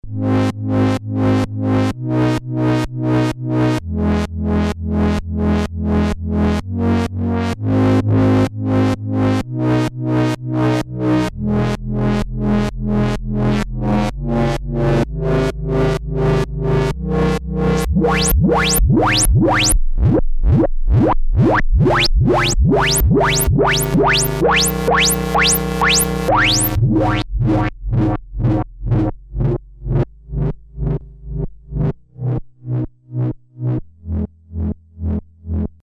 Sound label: Korg Monologue Pump Driver Shape Drive Filter